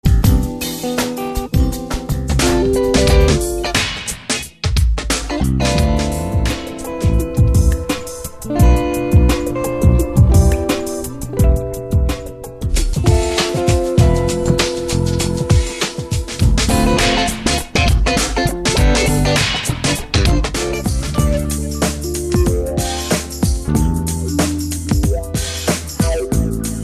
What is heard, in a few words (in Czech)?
improvizace